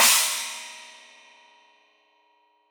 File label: China, Crash, China Cymbal; Deeply Sampled Drums; SMD Cymbals Stereo